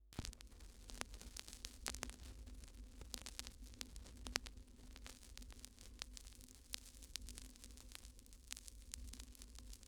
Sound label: Vinyl Crackles